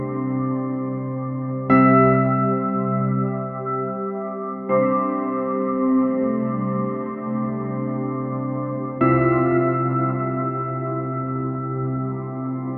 style titl, smooth end

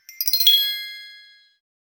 soft wind chime harp bloo; ability

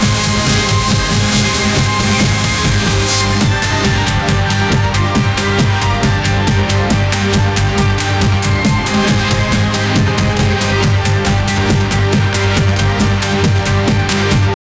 epic japan fight music

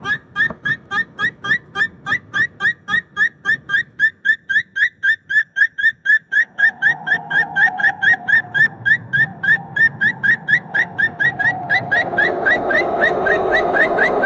make sound effect of tige, ambulance

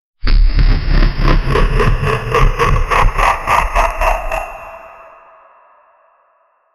giant robot laughter sound

